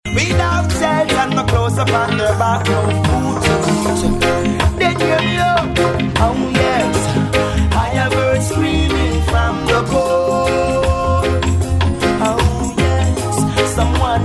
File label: screaming